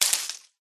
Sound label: fur tree wound